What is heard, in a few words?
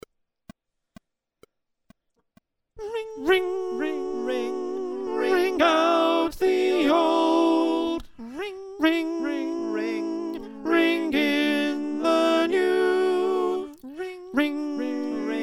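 alto, choir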